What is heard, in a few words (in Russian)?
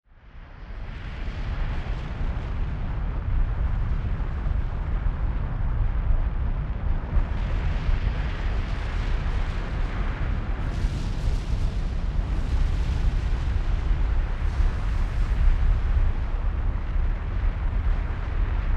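zvuk izvergajuschegosja vulkana, erupting volcano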